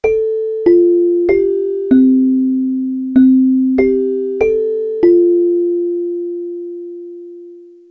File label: 8 klang celesta; echo